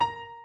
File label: piano, programmable speaker